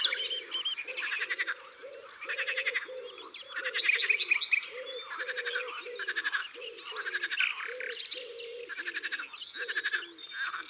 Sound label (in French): Pigeon ramier, Concerts Naturels de la Drome; Studio les Trois Becs